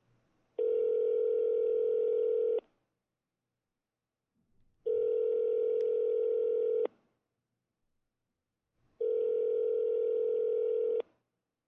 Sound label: telephone speaker rings voice mail beeps cell; Hotel Noel